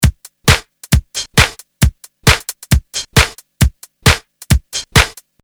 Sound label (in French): 134 sur la retenue; Loop Mania Sampler Pack